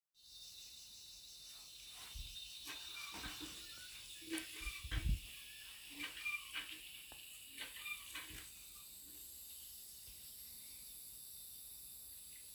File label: Chitwan sounds of the jungle; kasia